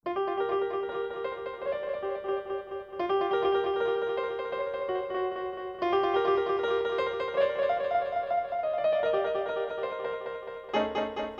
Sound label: jazz IIR comb